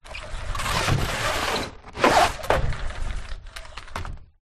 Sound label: household blind fabric old fashioned up open then down close